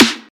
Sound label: sharp snare drum sample a sharp key